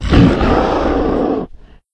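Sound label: attack; sinfar haks; sf effects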